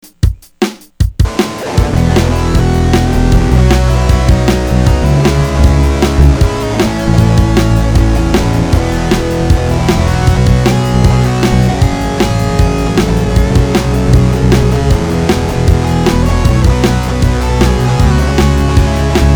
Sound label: guitar rock instrumental